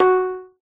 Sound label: pling, note; Minecraft